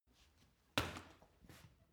punching bag kick soft

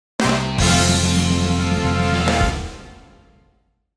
carnival fanfare

